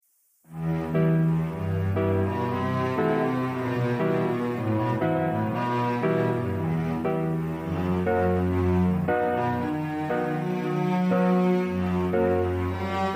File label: cello sonata; johannes brahms